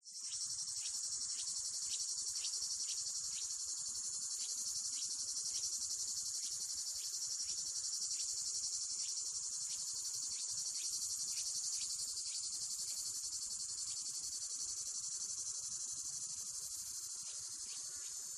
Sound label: CICADA Forest Tettigonia Cantans Nuthatch Fly Binaural